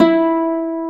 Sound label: Celtic Harp E3; Multisamples; Digital Sound Factory Planet Earth